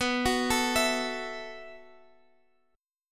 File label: B 7th Suspended 4th B down; B7sus4; arpeggiated